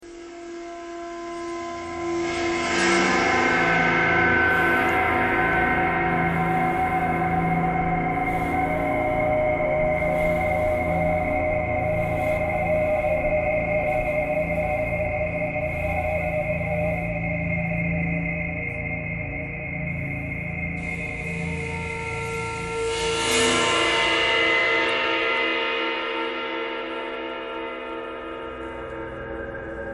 traffic k core birds ice bowed cymbal thread pentatonic; bowedmetal